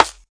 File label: warm acoustic snare f key; snare drum samples